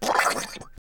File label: Mouth - Noise; Ed Edd n Eddy; Adromulus Pack